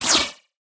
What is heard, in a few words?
Anime Punch Synth Swirl